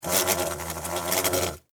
Wasp Attack, Wasp, Attack, Fly, Wings, Buzz, Insect, Bug; Wasps; Animals:Insects; Sound Effects:Animals:Insects; Blastwave